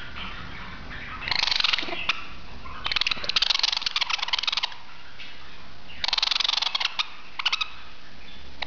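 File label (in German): energisches keckern, wellensittich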